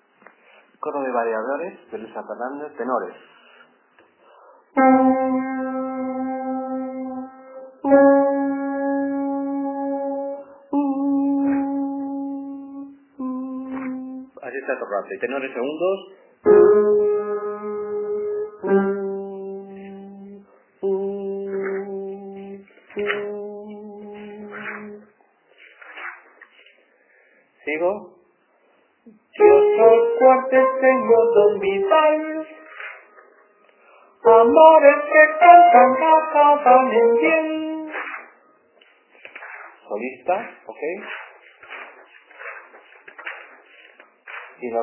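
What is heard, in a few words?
vareadores tenor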